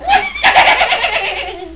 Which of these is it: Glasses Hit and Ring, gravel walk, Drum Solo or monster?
monster